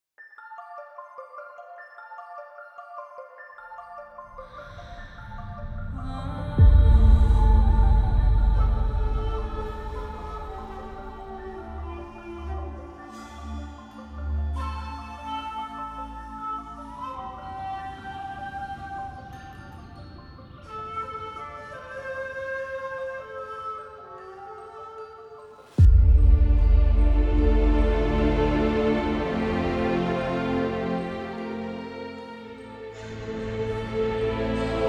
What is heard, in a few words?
Intro Music; Artificer Science of Magic